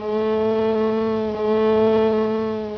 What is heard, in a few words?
bee; zmall